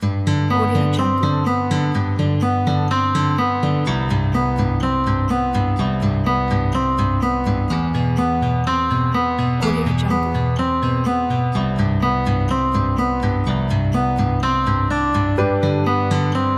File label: acoustic loop